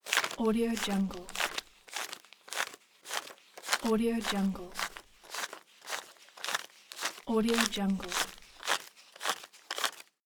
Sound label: money count